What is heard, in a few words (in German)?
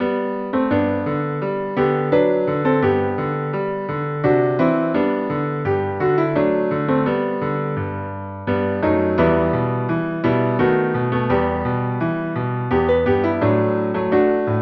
Klavier